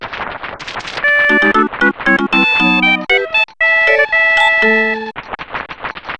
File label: level complete; Gnat Attack; Super Mario Paint